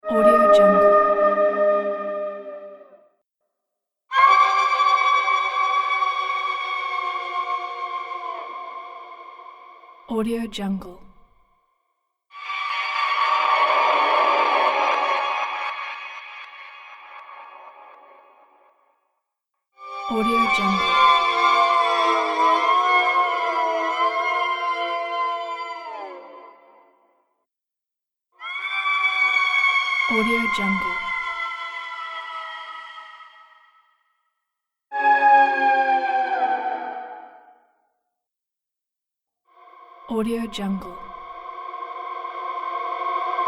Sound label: ghost screams sound pack